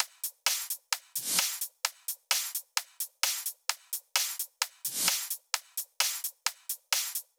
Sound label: 128BPM Drums; Vengeance Samples; Face to Face Kit